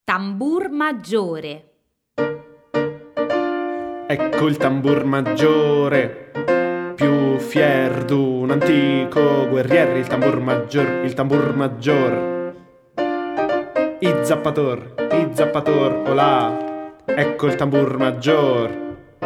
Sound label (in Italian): Tambur Maggiore Ritmica